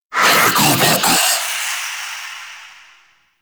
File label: Undead Screams; Warframe; Dante